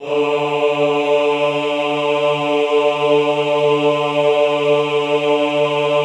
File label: AHH D2, Classical Choir; Best Service; Extended Classical Choir; AHH MIX